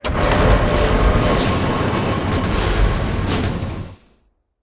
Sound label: dead, monster